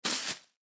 Footsteps Hard Sole Shoes Single Step Right On Leaves; Foley; Blastwave